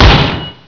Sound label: hit bod